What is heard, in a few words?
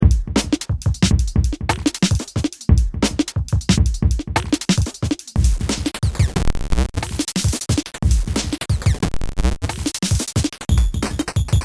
drum crusher; Doofers; Thebellows